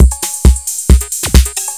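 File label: drum loop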